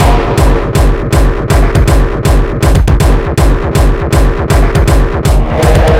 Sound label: Beats Loop; Anger Foot